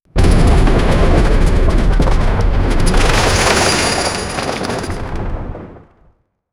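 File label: cinematic sound helicopter; 5 sec; vfx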